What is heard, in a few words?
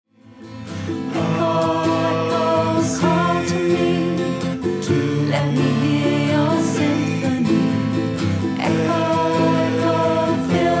ECHO